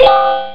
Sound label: coin get